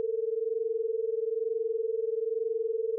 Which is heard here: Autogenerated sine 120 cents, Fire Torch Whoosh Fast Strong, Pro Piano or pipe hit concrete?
Autogenerated sine 120 cents